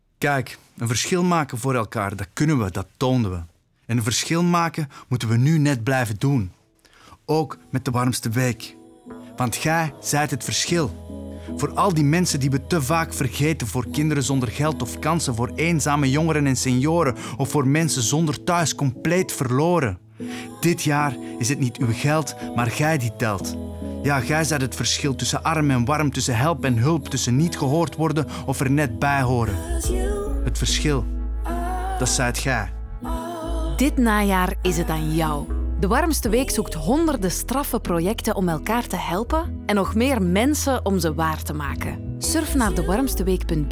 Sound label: Radio 45s Instru Loop